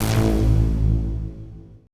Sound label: AMBI FX